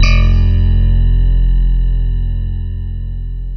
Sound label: Keys Electric Piano; Synthetic's Serum Collection; ESW X Keys